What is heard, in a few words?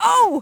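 f oof, female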